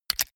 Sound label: medieval click light positive